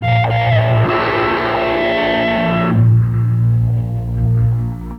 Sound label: Kaos FX, Ambient Grooves; Sonic Foundry (Sony Creative Software)